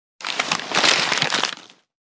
Large paper bag handling

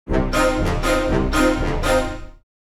Cartoon funny transition comedy dramatic

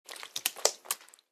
Disgusting wet vomit

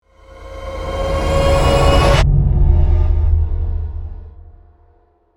Action trailer tension building

